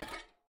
step, copper golem, oxidized; Minecraft

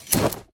unequip, llama; Minecraft

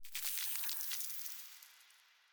ambient, dried ghast

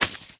unleash, leashknot

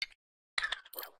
spin, copper golem, oxidized; Minecraft

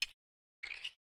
spin, copper golem weathered; Minecraft

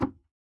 place item, shelf

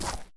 place, dried ghast

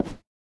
single swap, shelf

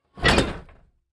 copper chest oxidized close

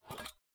step, copper golem weathered; Minecraft

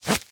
leash, leash knot

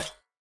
step, coppergolem; Minecraft

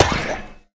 hurt, copper golem, oxidized; Minecraft

